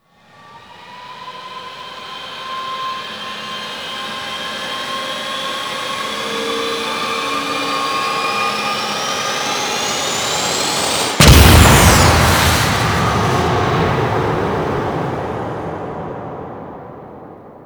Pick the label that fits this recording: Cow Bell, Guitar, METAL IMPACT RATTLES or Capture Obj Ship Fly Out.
Capture Obj Ship Fly Out